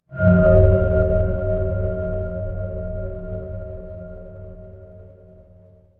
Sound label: horror drone